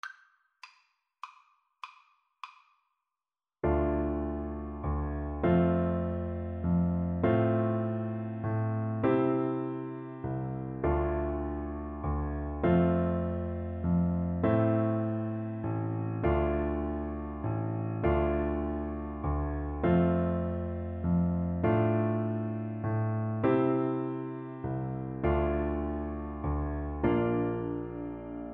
Bass Clarinet; Appalacian carol

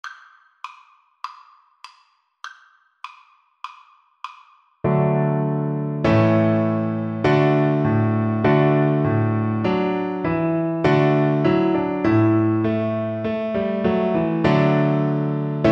trumpet voluntary, Viola

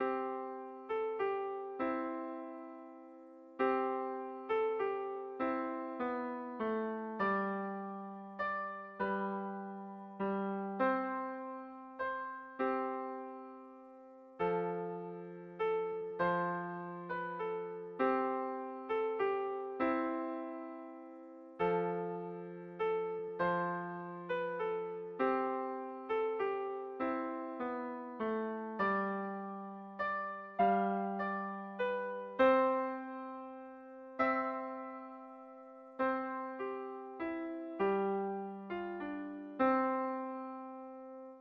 silent night easy, piano

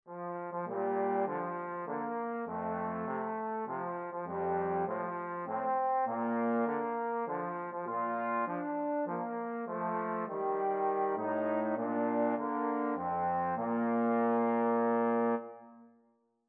happy birthday, trombone duet

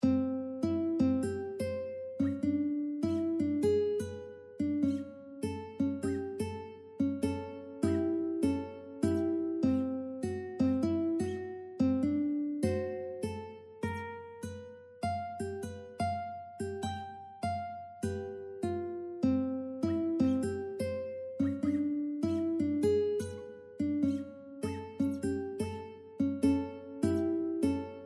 silver sand, ukulele

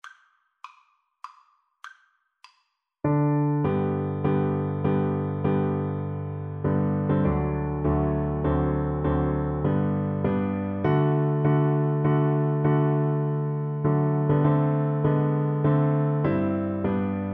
Alto Recorder

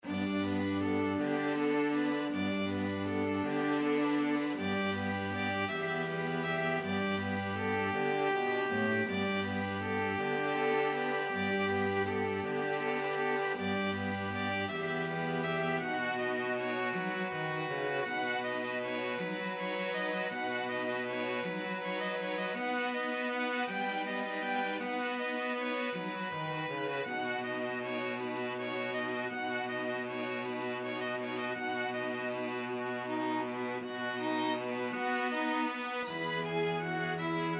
string quartet

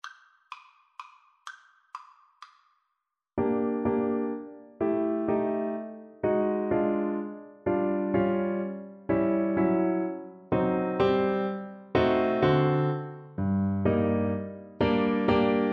mazurka Flute

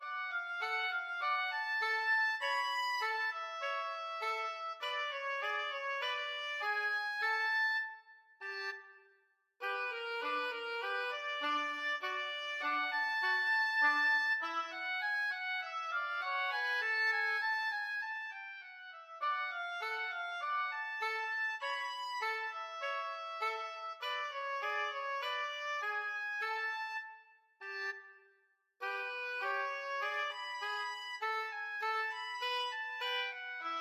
oboe duet

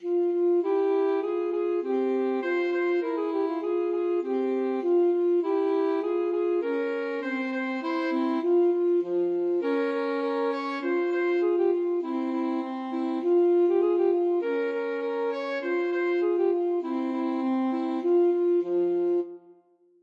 alto saxophone duet

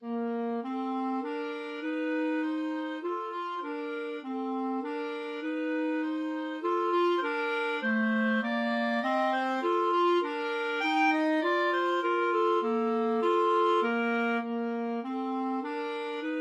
Menuett clarinet duet

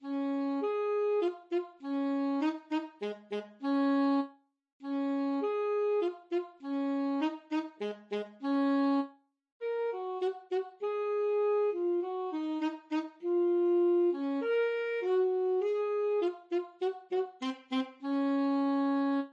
ASAX, saxophone

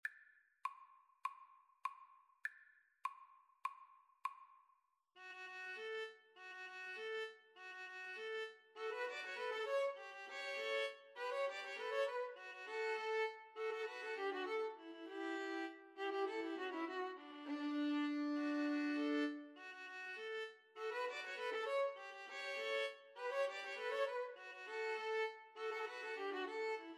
neopolitan, String trio

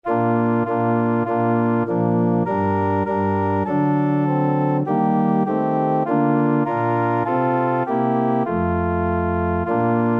organ

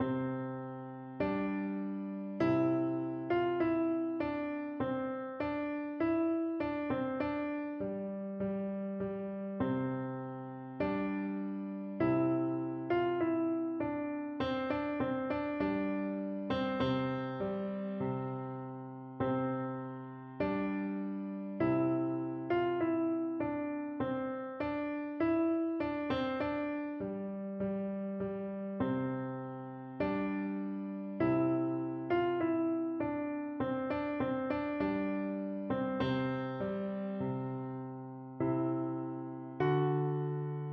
trumpet voluntary, piano

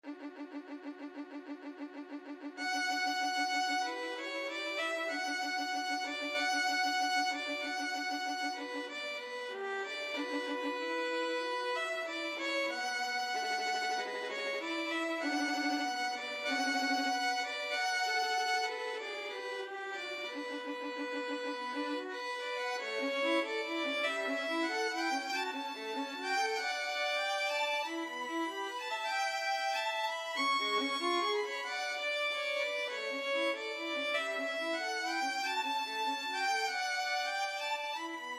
scene finale, violin duet

